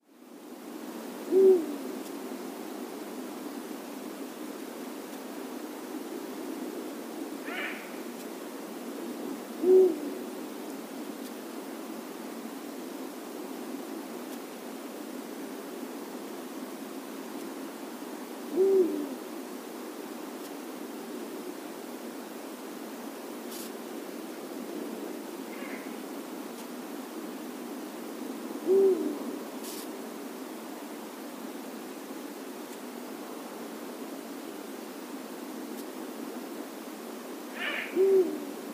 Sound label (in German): Uhu Bubo bubo